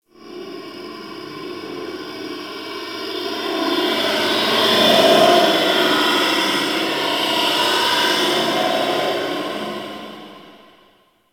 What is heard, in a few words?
Ice Distant Robotics; Ambience; Warframe; Corpus Ice Planet